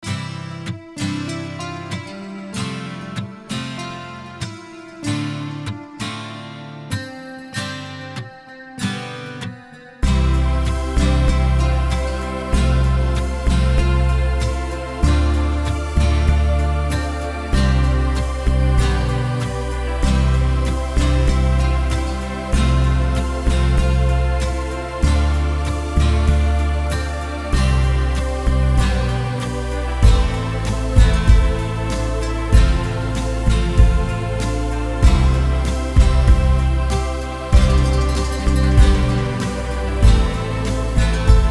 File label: End Theme Unplugged Version